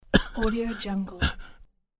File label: male cough; سرفه مرد; human sounds